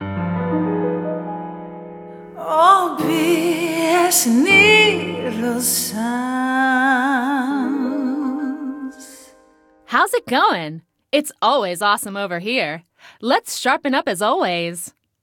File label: Radio Jingle; let it die; OBS